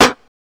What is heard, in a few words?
Snare